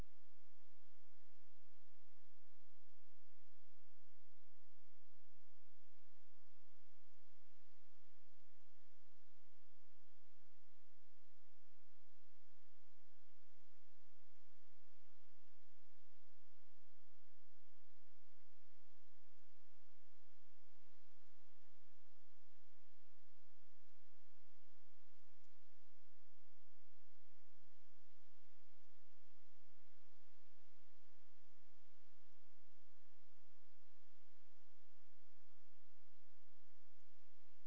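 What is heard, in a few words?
hydrophone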